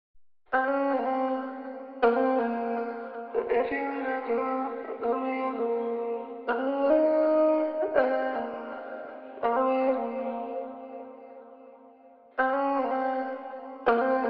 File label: Vocal Loop 162 BPM A# Min